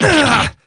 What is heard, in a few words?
damage; p4u